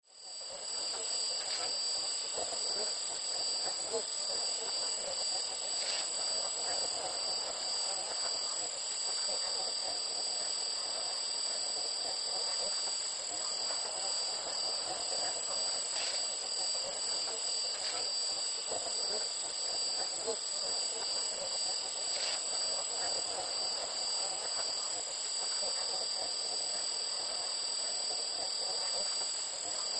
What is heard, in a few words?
Communications Static, static voices; Communications Static Faint Voices